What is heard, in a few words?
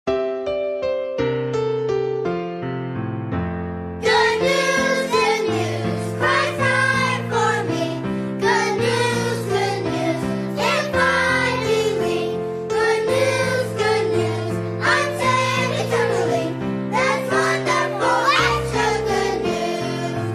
Singing Unto Zion Good News